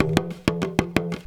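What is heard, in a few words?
small tama groove; Best Service; World Colours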